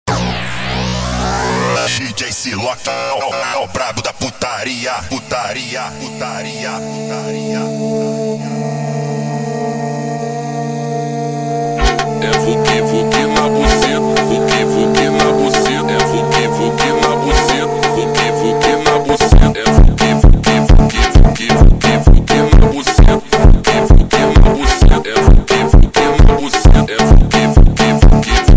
Alien Horror Funk slowed

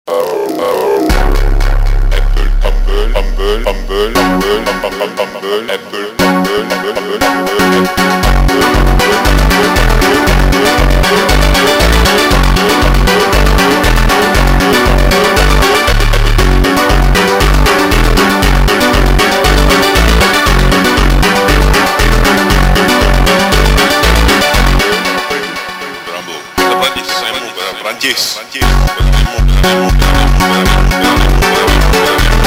Funk slowed

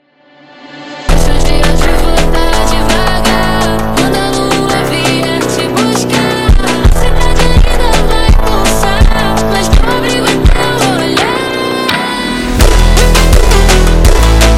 Funk super Slowed

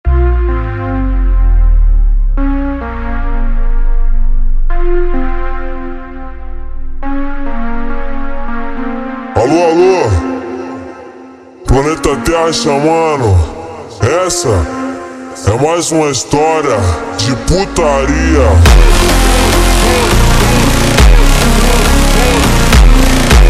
Funk super Slowed

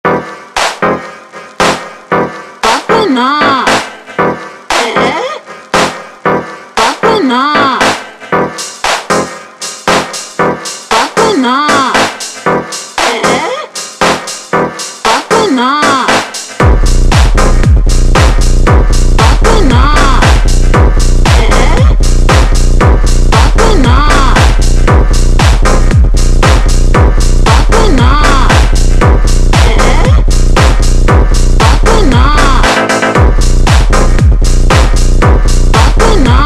super Slowed Reverb, Phonk

